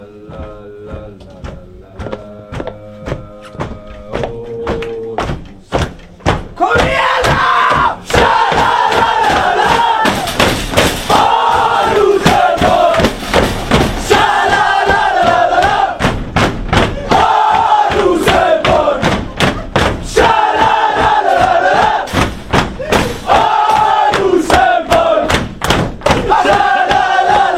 Shalalala; Rosenborg

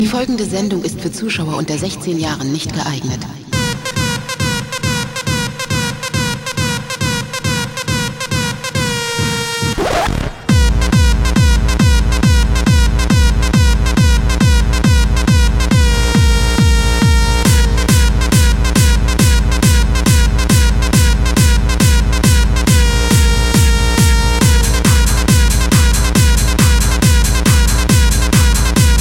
Ident

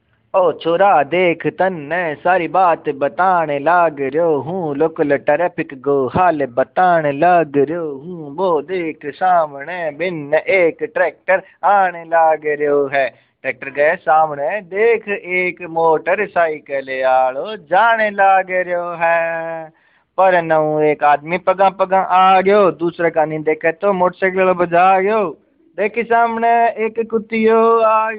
rajasthani local

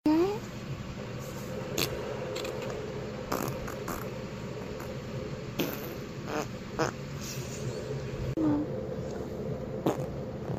Farting Gas Long

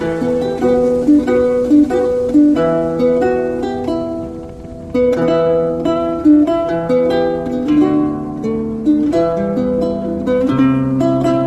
Lute

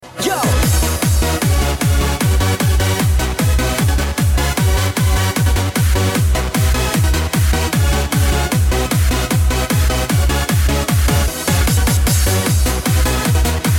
Bass Dance

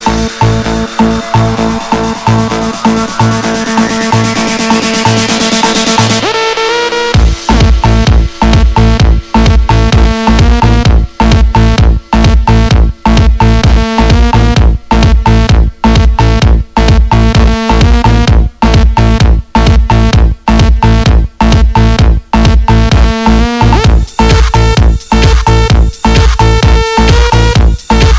disco

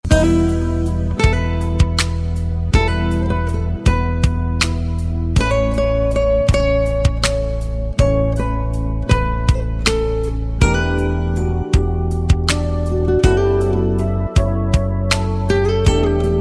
ROMANTIC TONE, ringtones

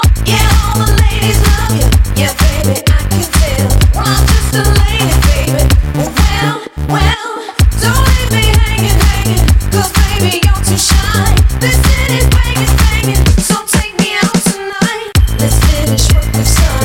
Kudu Horn

